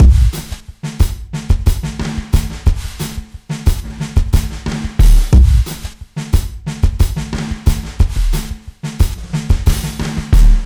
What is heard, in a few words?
090bpm, Downbeat Dance Grooves